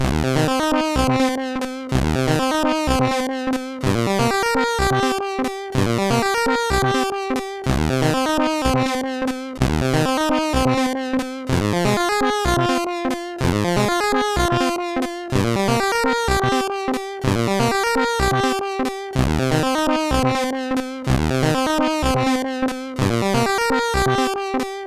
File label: sid CSG 8580R5